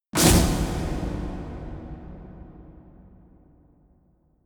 reverby snare impact; percussion

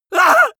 male painful shout short; vocals; comedy-cartoon; soundpool

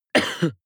cough male single attenuated soft; koerpergeraeusche

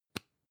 lipstick open soft; haus-garten; badezimmer

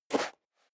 step high heels sand single right

